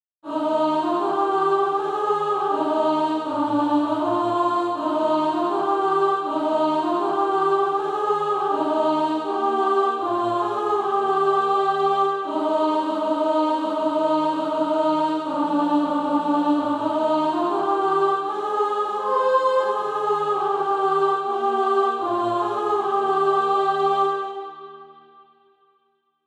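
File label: alt, Practise Music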